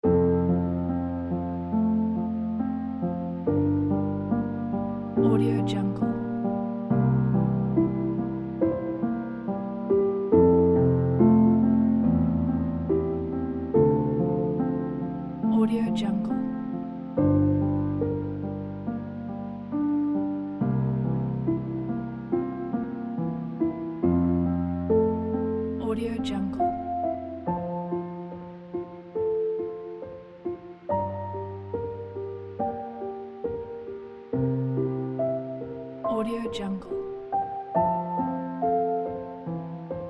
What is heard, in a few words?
thoughtful piano loop